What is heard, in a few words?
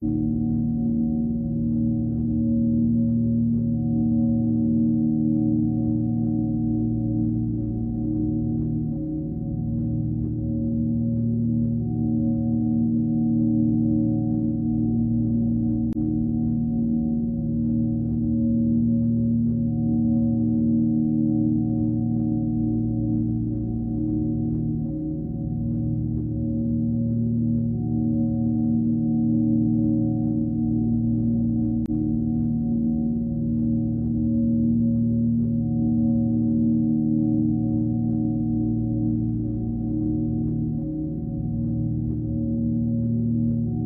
BGM